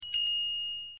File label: cam snap